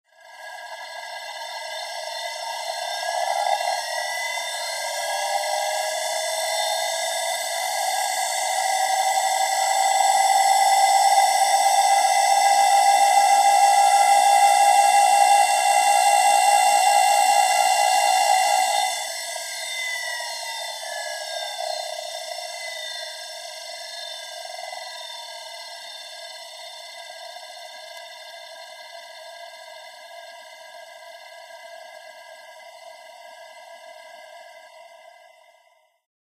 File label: Synth Motor Start Up, centrifuge